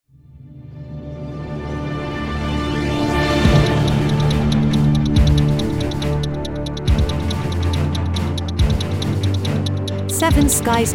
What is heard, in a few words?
Cinematic action